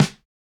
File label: snare samples, Rock